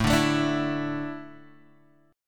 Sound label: A Suspended 4th down Guitar Drop D